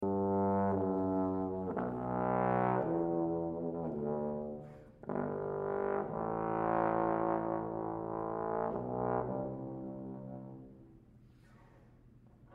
kontrabastrombon

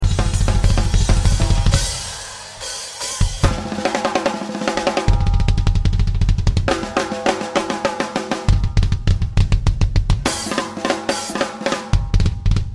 trummor

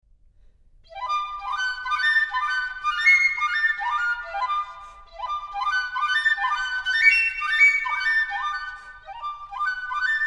piccolaflöjt

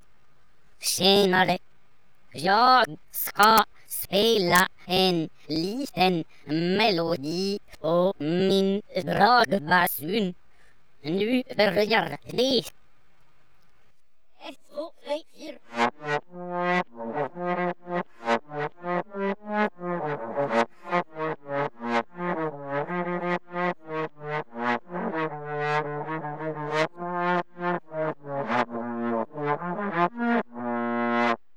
på dragbasun

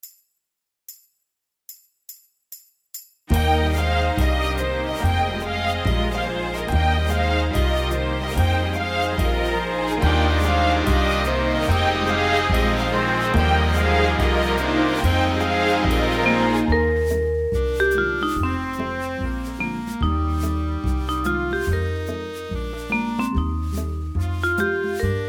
med sångstämman spelad med vibrafonljud

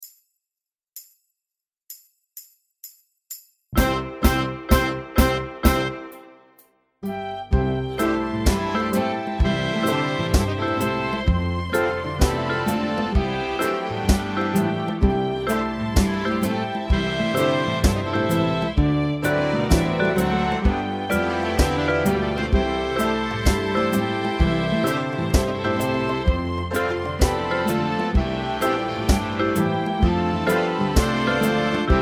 Kulturskolans sång, komp, gitarrer, pianon

